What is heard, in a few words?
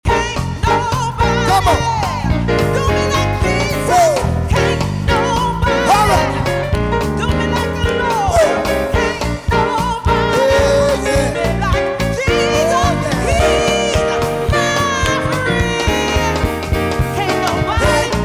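gospel medley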